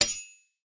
Knife Clash; soundscrate

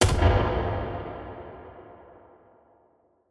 Gun Shot Reverb; SoundsCrate

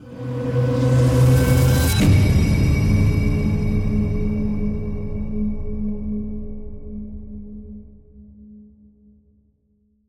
trailer riser; soundscrate

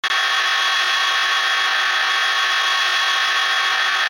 robotic arm long; soundscrate

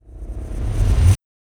horror noise swell; soundscrate

